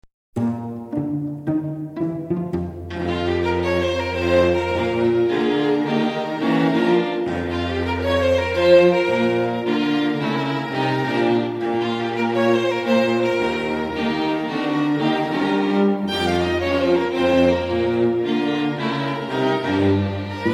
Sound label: blues ragtime